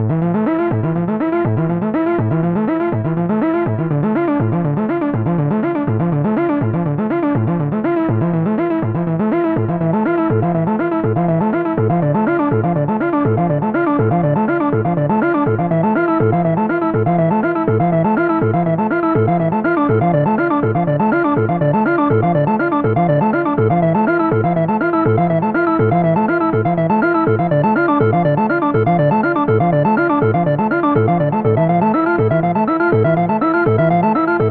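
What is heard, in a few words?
Synthloop 122BPM E minor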